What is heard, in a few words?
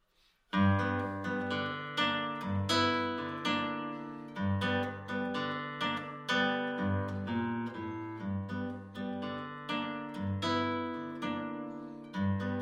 Blue Rumba